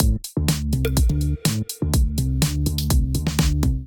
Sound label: Give & Take Kit Melodic 124 bpm, Drums; MIDI Clips; Drive and Glow; Ableton; Factory Packs